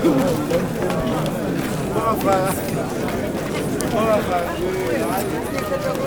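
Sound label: AFRICAN CROWD; Spectrasonics; Heart of Africa 1; AF.CROWDS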